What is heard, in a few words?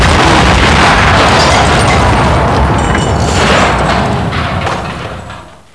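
car explosion; debris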